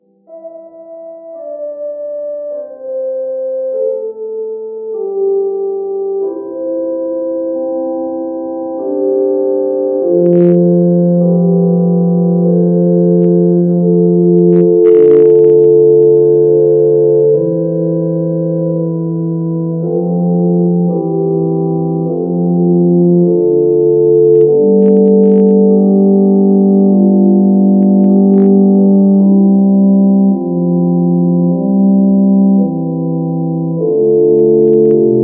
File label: Music Interior Funeral